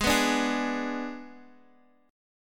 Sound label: G sharp Diminished, Guitar, Half Step Down